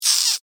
Insect Hiss Angry, Animal, Insect, Hiss, Angry, Bug; Insect Voice; Blast Wave